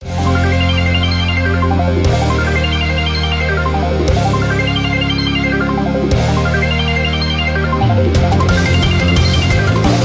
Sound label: ringtone